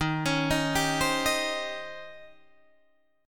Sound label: Eb 13th, Guitar, Open D